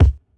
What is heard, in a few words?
phat bass drum single shot c sharp key; kick